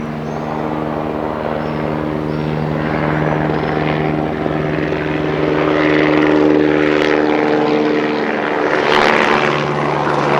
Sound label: Airplane